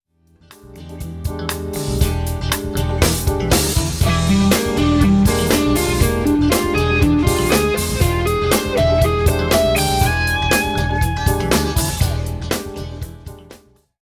Fretboard Navigations, Guitar Techniques; Guitar Techniques 2019 Issue 297 July

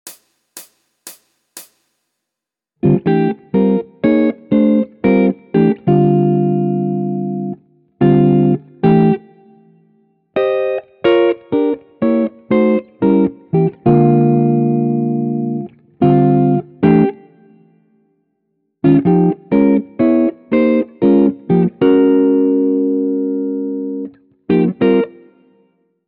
Jazz Chord Melody, Guitar; Guitar Techniques 2019 Issue 297 July

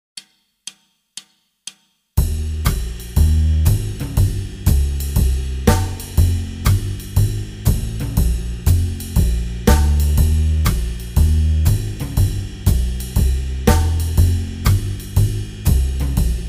Jazz backing, Guitar; Guitar Techniques 2018 Issue 278 February

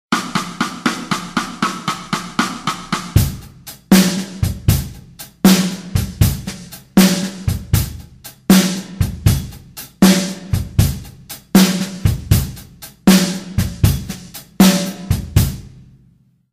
Slide backing; Guitar Techniques 2018 Issue 278 February

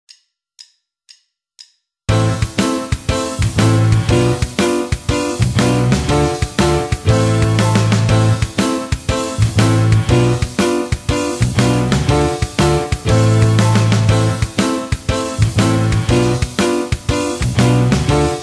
Rockschool backing; Guitar Techniques 2018 Issue 278 February

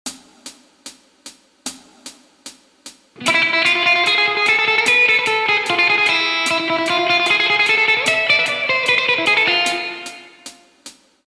Lickbag, Guitar; Guitar Techniques 2019 Issue 297 July

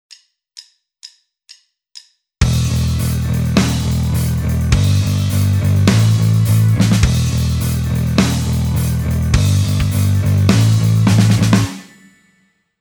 backing; Guitar Techniques 2018 Issue 280 April

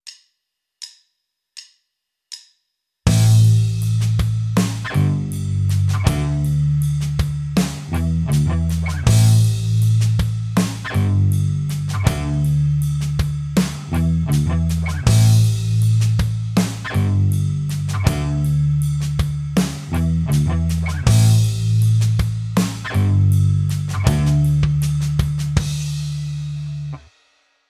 Tapped Harmonics, guitar

